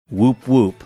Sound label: whoop whoop